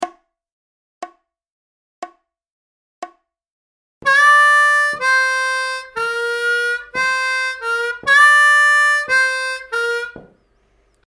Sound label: Intermediate Licks in G 60BPM, harmonica